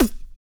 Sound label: SLOBBER; Spectrasonics; Planet